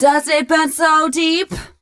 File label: f mel a flat, fem Vocals